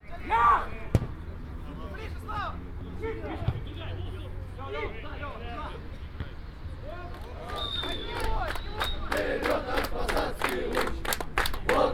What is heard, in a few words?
football fans, sergiyev posad